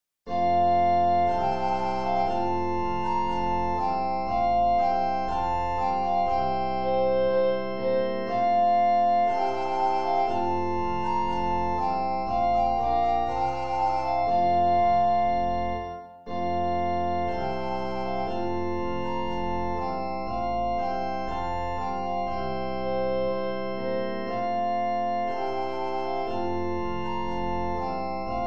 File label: trumpet voluntary; clarke jeremiah